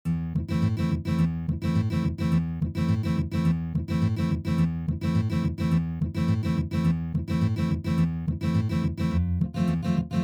Boj; Kazachya

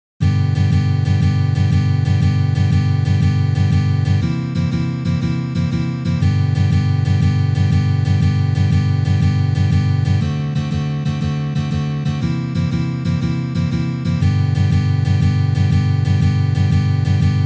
Uprazhnenie s akkordom D7

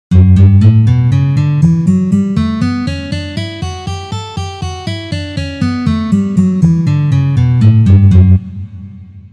Gamma Re mazhor 3 pozitsiya

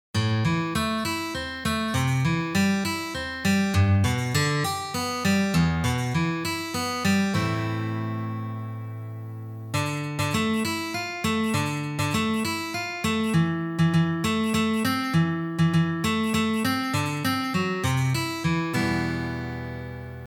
Uprazhnenie na peremennyj shtrih

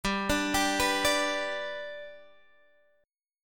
Akkord G lad perebor

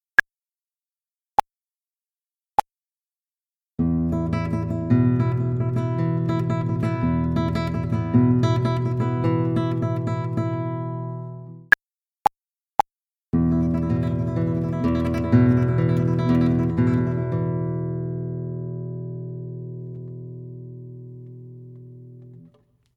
Uprazhnenie Tremolo na gitare

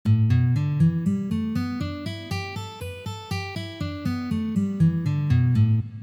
Mazhornaya pentatonika

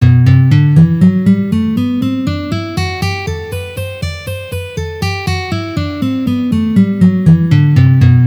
Gamma Sol mazhor pozitsiya

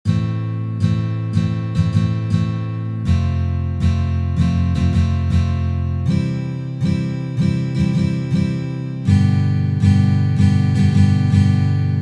boj na gitare shema dlya kupleta

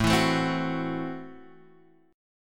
Akkord Am6 boj

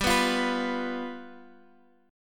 Boj G7

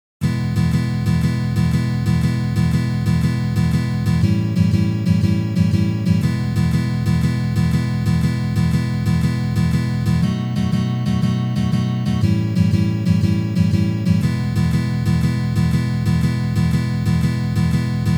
Uprazhneniya dlya igry akkorda B7

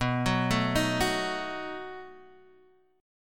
Perebor B7

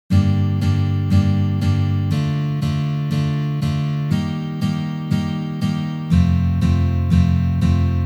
Uprazhneniya dlya igry akkorda Sol minor na gitare

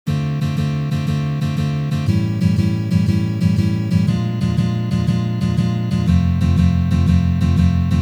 Uprazhnenie dlya igry akkorda Dm7

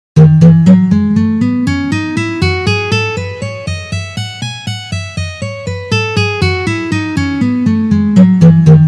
Gamma Mi mazhor Tri noty pozitsiya

